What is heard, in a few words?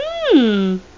hmm